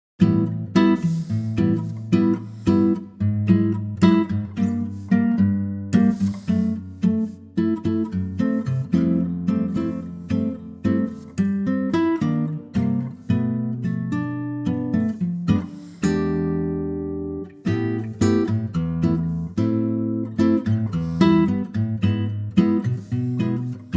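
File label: Am acoustic guitar; key - Am - vocal range - A to G; Beautiful acoustic guitar arrangement; bossa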